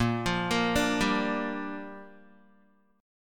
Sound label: A sharp 6th, Guitar; A#6; arpeggiated; 6,8,8,7,7,x